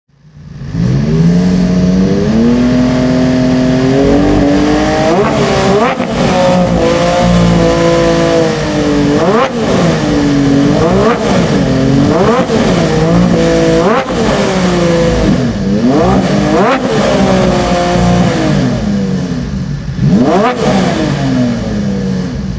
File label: horex vrx soundcheck